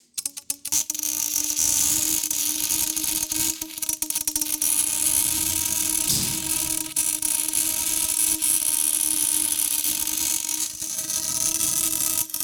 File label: Industry Tool Torch Weld